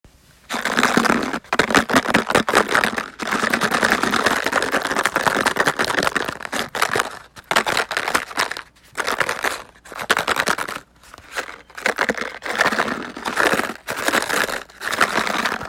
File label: Paper Instrument